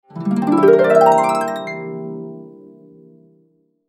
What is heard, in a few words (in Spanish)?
arpa